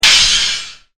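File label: GLASS CRASH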